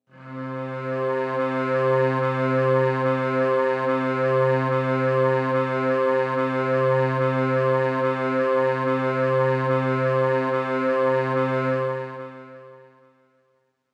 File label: Korg M1 INSTR Old Strings Low - C; Synth; One Shots; Cymatics; Fantasy Synth Sample Pack